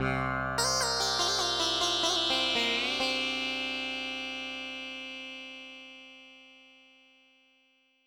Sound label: sitar; General MIDI